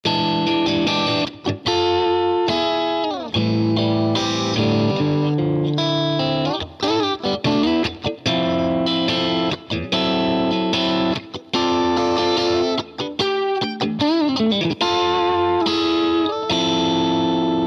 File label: guitar eq